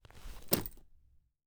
leather,metal,twist,contort,large,supple,lining,flimsy metal,zipper,drop; slight distance; Eiravaein Works; Quirboilly